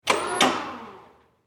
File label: Industry Hi Lo Motor On And Off Short; Motors; Machines; SFX Bible